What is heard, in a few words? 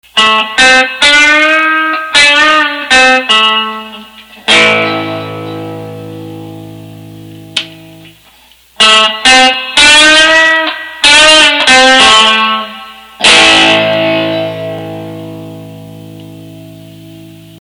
tube; electra